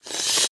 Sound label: wrapped charging; Candy Crush Friends